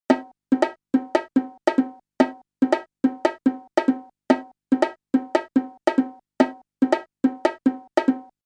4 repetitions of the previously unpracticed rhythm 5:4